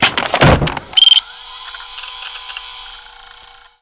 data grab; estate; Call of Duty Modern Warfare

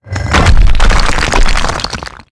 fence stone mid; World of Tanks Blitz

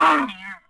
Camel Hit Vox; Civ4 warlords

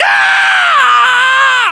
death, voiceovers; ModernWarfare; localized english

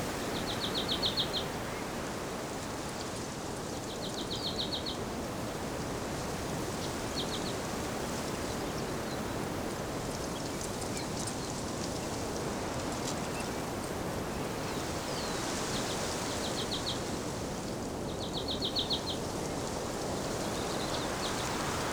ambience forest; Rust

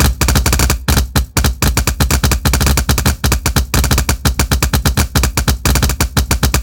Packard Merlin Prop Crackling; DCS

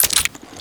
anaconda reload clipin

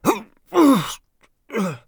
death; Escape from Tarkov; scav3

